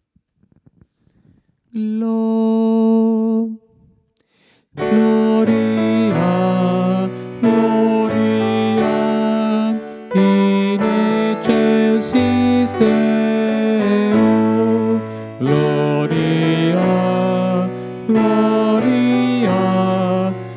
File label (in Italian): tenore; gloria di lourdes